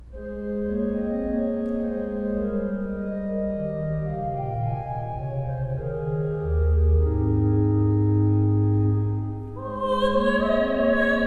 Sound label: Wedding Hymn